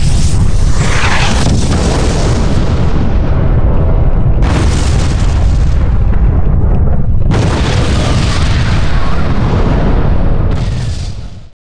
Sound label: Bombardment, GroundCombat